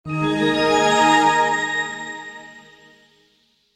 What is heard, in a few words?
Shutdown, Shutdown, Computer: Short Musical Style Phrase; Miscellaneous Musical; Stuart Duffield